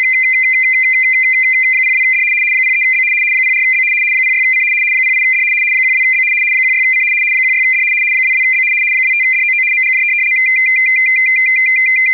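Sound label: Baudot 1.5 stopbit; 75 Bd/340 Hz; Royal Navy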